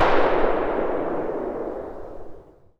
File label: pistol fire tail; weapons; Half Life Alyx